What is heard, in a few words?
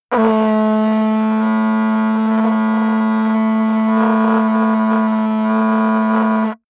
mouth fart; soundpool